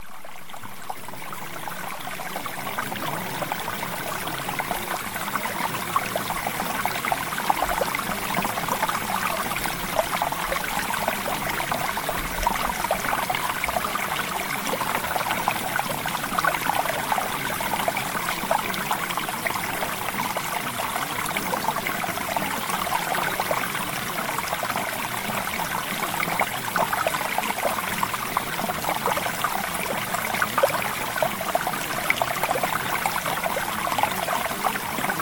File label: Water